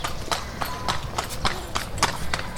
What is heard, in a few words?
horse asphalt, horse walking on asphalt clip clop; soundscapes